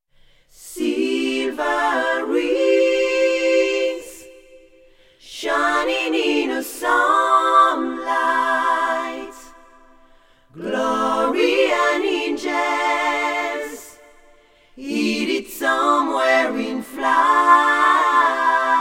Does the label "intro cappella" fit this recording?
Yes